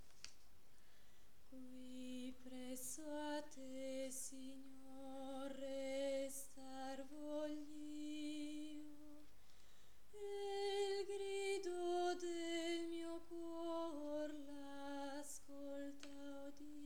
qui presso a te, contralti

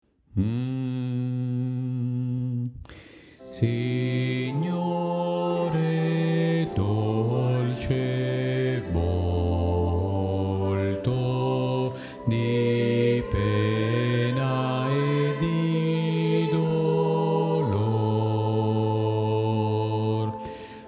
bassi

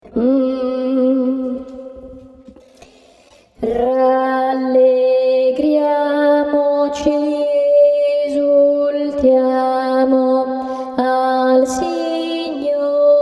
contralti

